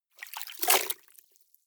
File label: clothes water updown short, haushalt; haus-garten